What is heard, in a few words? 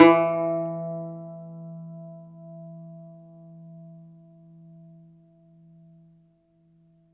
DOMRA, Plucked Strings; E-MU Producer Series Vol. 7 – Old World Instruments